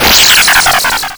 Bonus sound; blastmorph pinball; xbox series xs

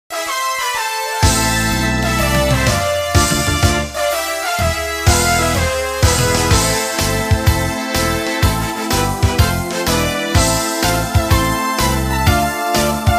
Music Menu event

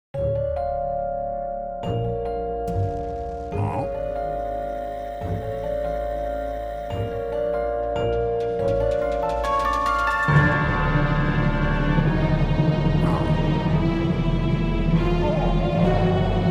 Ending Slot Machine Good Music; The Legend of Bum Bo

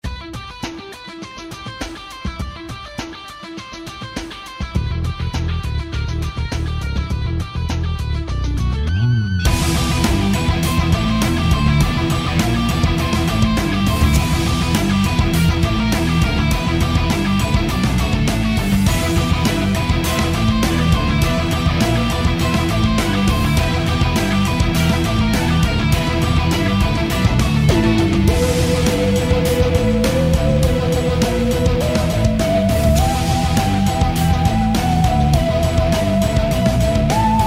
music; catchme; iDaggers; Android

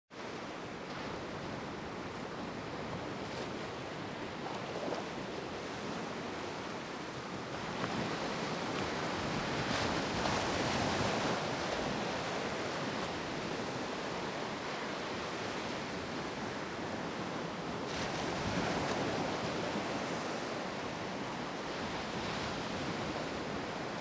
Atmosphere Sea Waves Break Distant; Fading Afternoon

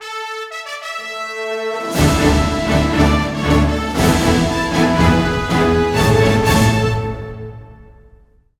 Victory; Heroes Over Europe

